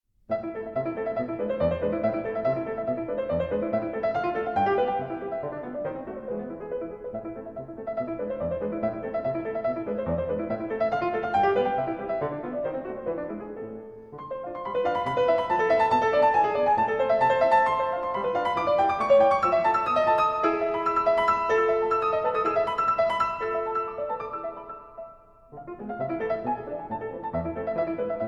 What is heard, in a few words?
String Quartet No 14 in D Minor D 810 Allegro; Death and the Maiden